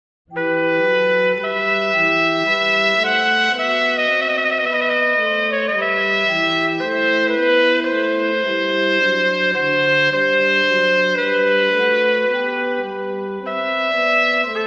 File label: Sarabande Sonata in E minor, Horn and Organ; Arcangelo Corelli